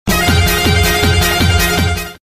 sonido coches chocones

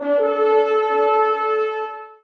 campana; aoe

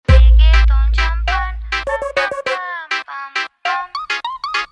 reggaeton champagne

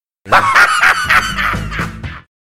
risa breve

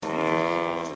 mu vaca

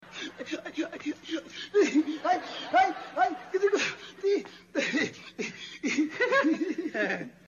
risa; don ramon

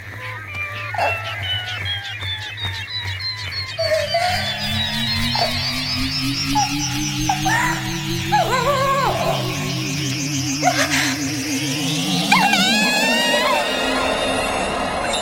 helicoptero

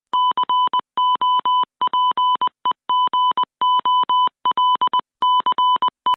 pitido de gol; cadena cope

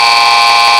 buzzer cigarra

